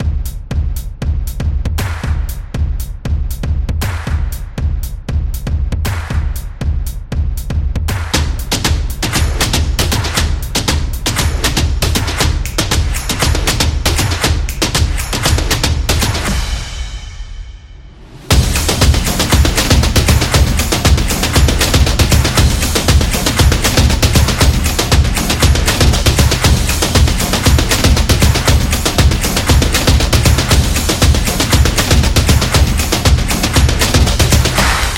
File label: Percussion Intro; upbeat drum